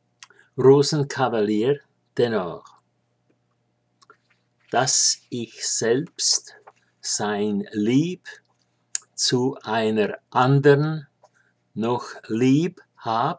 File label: Tenor; Rosenkavalier; Hab' mir's gelobt (Strauss)